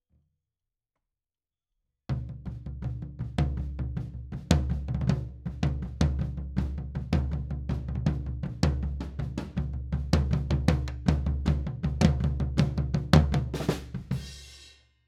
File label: Floor, 160 BPM